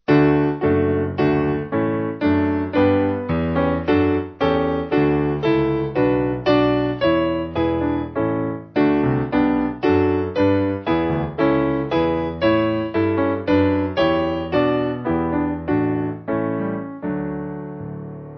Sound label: Piano